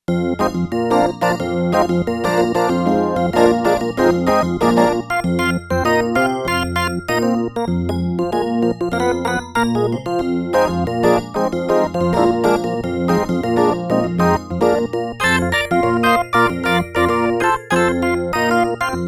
Bell Electric Organ, Yamaha TX802; Performances; Factory Patches

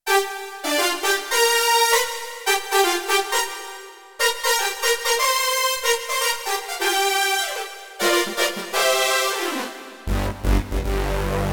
Roland D-70 Spit Brass; Factory Performances

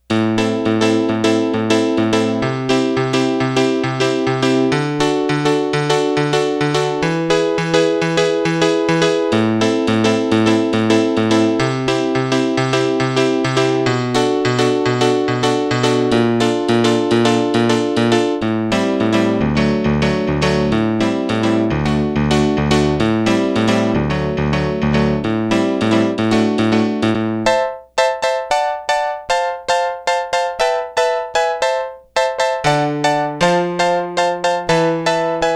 DIGITAL PIANO

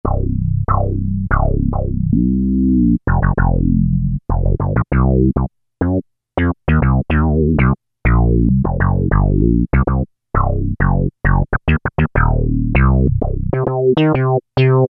Bass, Korg MS2000; Factory Patches

